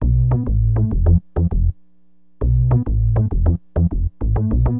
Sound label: SYN BAS, Acid Jazz Funk